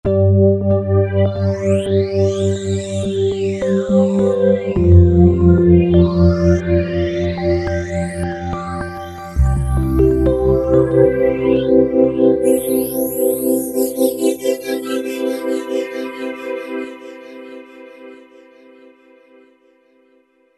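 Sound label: Ambient Electric Piano, Roland V-Synth; Factory Patches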